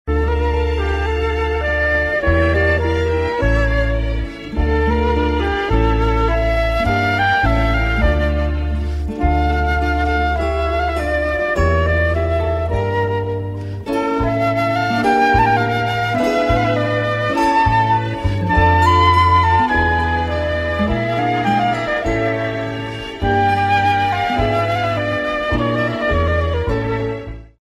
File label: sad flute ringtone